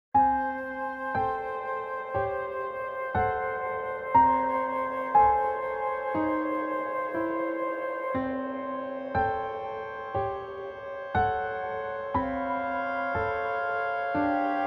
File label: Suspense-Thriller